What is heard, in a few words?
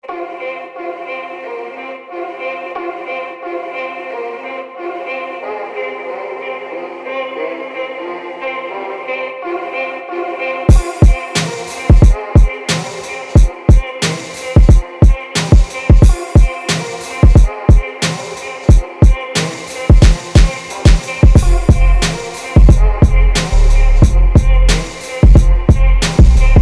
Fmaj 90 bpm